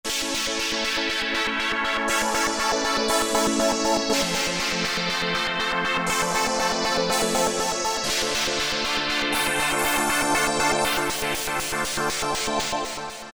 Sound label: Pad, Alesis Micron; Factory Programs; Silver Lining